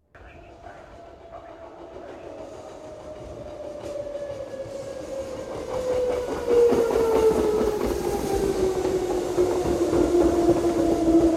Monorail Arrives

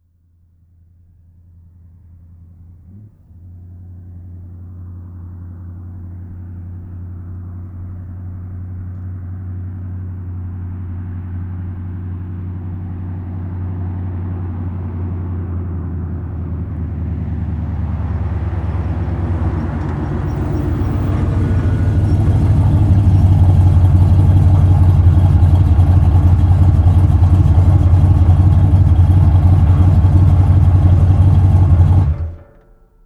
Muscle Car In Idle Off; Vehicles

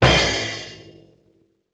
Bass Drum Cymbal Hit; Vintage Cartoon; Drums & Percussion

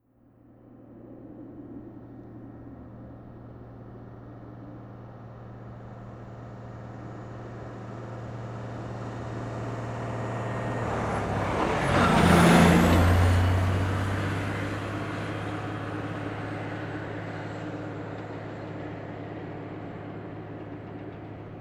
18 Wheeler Shift By 30MPH; Vehicles

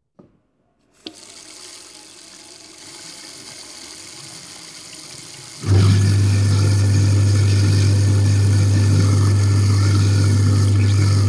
Kitchen Sink

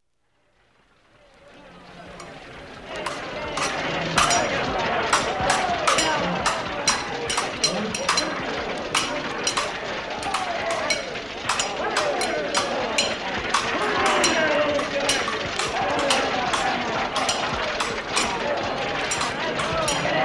Pirate Swords

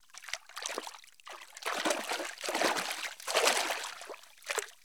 Splashes Soft

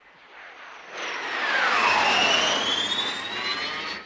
MIG Sweetener; MIG Sweetener 09

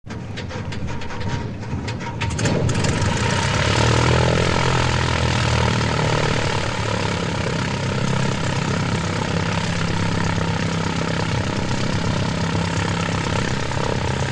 Cessna Ignition

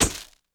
Camera Classic Flash

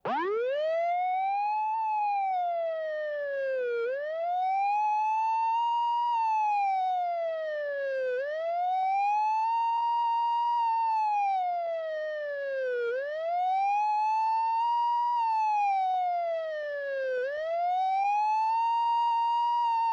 Police Siren Steady; Vehicles

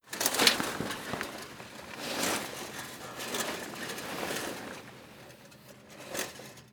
Boat Sail Hoist; Vehicles; Watercraft

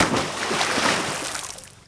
Splash Hard

